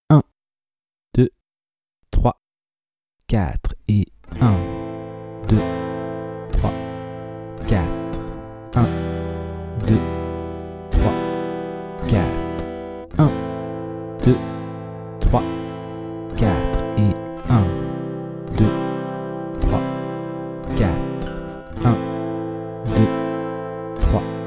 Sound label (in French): 2 lent travailler la rythmique avec la grille; la grille d’accords vu précédemment (Am – Em – Am – C). Il faut faire une rythmique par accord et ne pas faire de pause lors des changements.